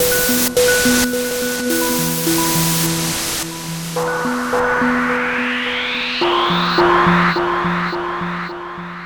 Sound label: Waves Melody; Sonic Foundry (Sony Creative Software); Loop Noir